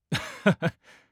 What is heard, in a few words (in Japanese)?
あっはは